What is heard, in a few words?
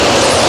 Sfx Winter games